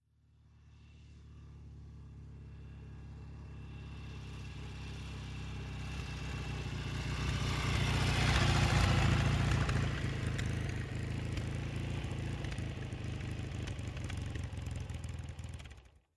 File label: Mcycle Norton Slow By